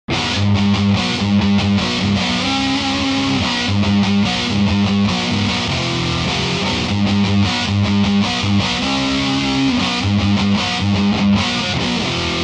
badcat double gain

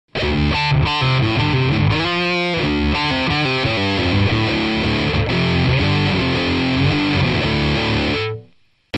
Audio-Technica ATM23 Gain (Bridge), guitar

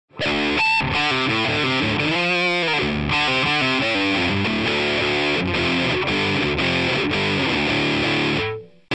Electro-Voice ND967 - Gain (Bridge)

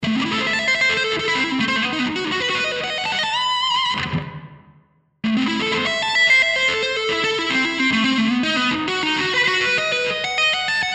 tapping, guitar

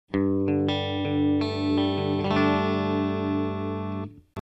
Audio-Technica AT4040 Clean (Bridge Neck), guitar

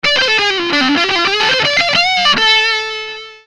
marshall high g f solo

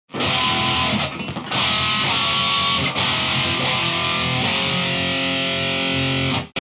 tubeman

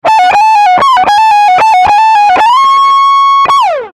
marshall high g solo, guitar

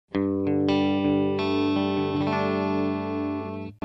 Beyerdynamic M260 - Clean (Bridge+Neck)

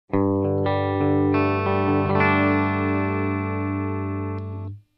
Audio-Technica ATM23 - Clean (Bridge)

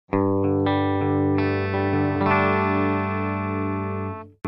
Sennheiser e604 - Clean (Bridge)

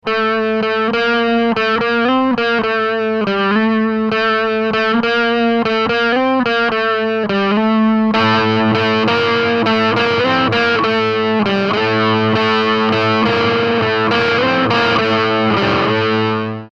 marshall low g

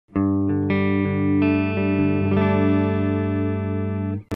Audio-Technica AT4040 - Clean (Neck)

